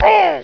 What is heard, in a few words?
pain; fgrunt